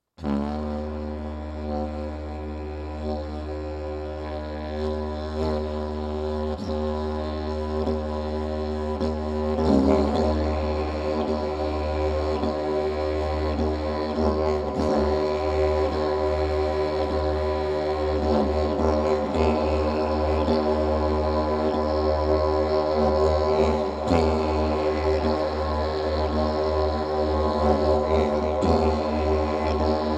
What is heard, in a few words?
forest floor